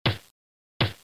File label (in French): Marteau; bruitage; Outillages